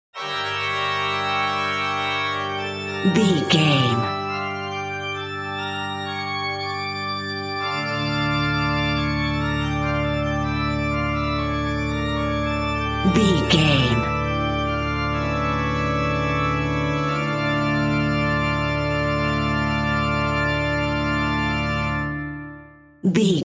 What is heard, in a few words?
phantom organist bumper